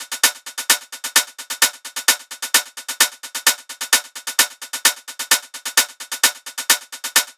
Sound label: Hat Loop 130, Techno